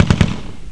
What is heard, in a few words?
MACHGUN; BloodBath